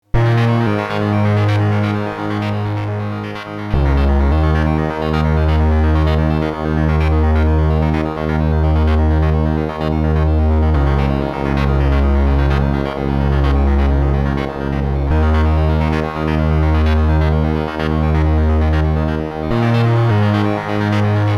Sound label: wavestation